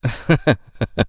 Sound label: laugh; Eternal Return; ENG